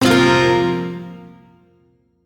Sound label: Shawzin Hirajoshi Chord; Warframe; Duviri